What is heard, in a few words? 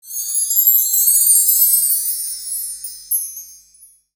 Belltree, Percussion Kit; Akai MPC2500